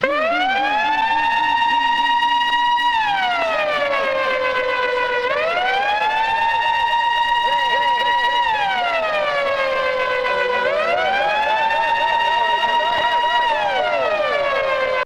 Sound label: siren, Vehicles